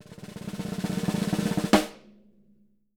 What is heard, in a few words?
SN ROLL, SD ROLLS, Living Drums; Sampleheads; Peter Erskine